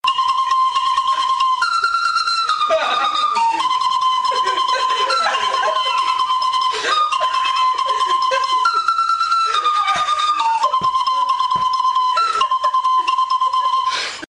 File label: trumpet